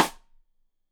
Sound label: Side Stick Stereo; Deeply Sampled Drums; SMDrum